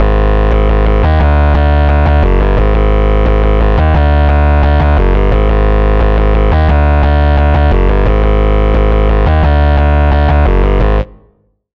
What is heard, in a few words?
Bass; Overload; Routed Sound; Vital Expansion - Hyper Gamer Vol.1